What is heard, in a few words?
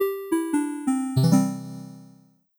gameover; cube-tube